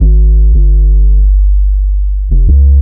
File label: super bellbass, BASS LOOPS